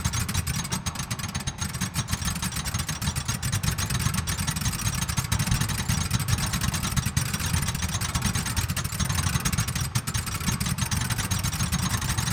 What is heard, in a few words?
under up close floor gears loop; Hollow Knight: Silksong